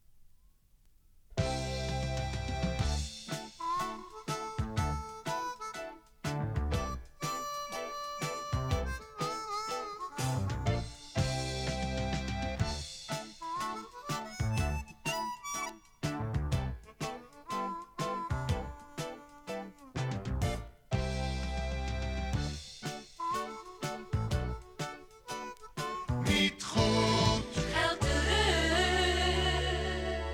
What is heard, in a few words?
topformat maxi jingle single; topformat maxi jingle single4